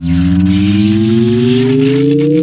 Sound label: Powrup; Emperor - Battle for Dune